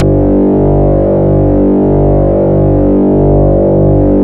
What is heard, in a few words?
CLIK, Bass; Distored Reality 1&2